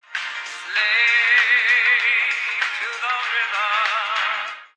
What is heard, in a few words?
slave sung radio; SAMPLED MUSIC